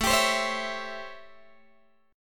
A 7th Sharp 9th A down Guitar Open G

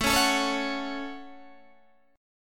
A 6th, Guitar, DADGAD

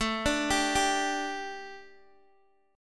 A 7th Suspended 4th Guitar Open G, chord

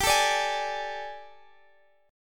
G Suspended 2nd Add Flat 9th G, chord; Gsus2addb9; sus2addb9:1,2,(5),b9

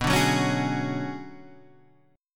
B Minor 6th Add 9th B down Guitar Standard; Bm6add9 strummed; JGuitar

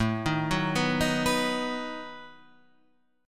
B-Major A

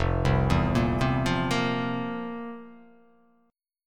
F Major 7th Suspended 4th; arpeggiated; 1,3,2,3,0,0,0,x; JGuitar

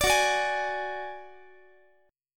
F sharp Suspended 2nd Sharp 5th, Guitar; strummed; x,x,17,16,18,19; down; 4A,4E,5A,5D,5Gsharp,6Csharp

